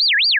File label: buzz